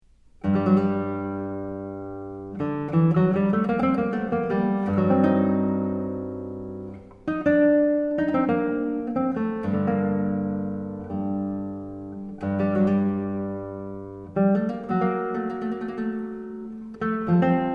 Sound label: prelude bwv 1011